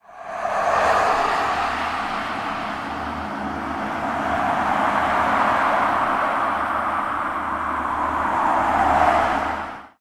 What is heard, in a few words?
Countdown Noise; Sports; Tackleball